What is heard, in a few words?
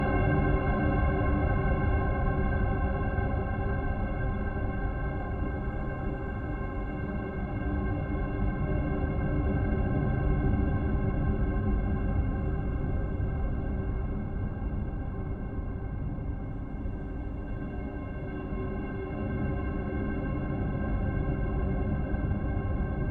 Bell Low Key Loop; Twilight